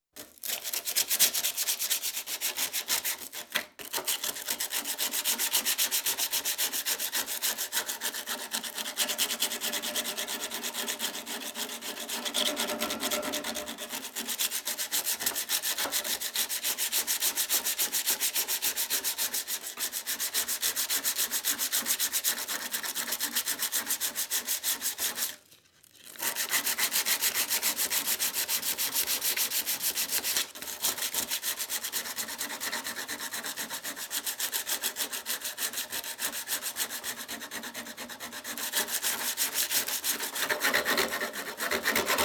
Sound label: Sanding, Sheet Of Metal; Construction; BBC Sound Effects Library